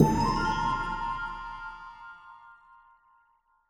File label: Change Horns